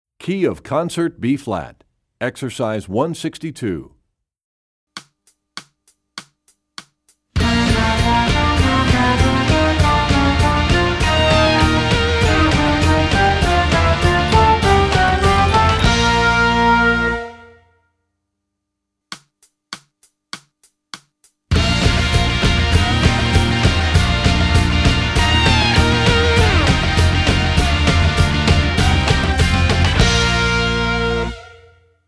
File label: Studies Concert Bb; EE2000 Book2; Rubank